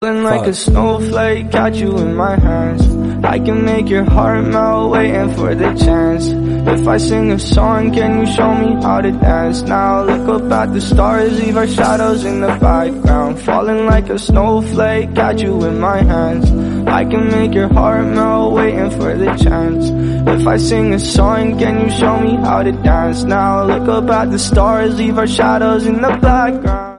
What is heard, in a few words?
English Song